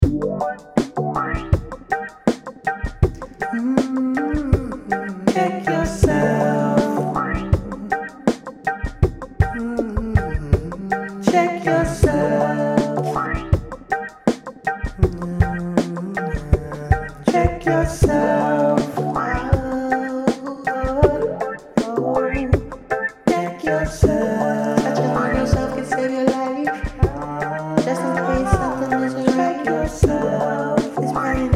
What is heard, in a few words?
instrumental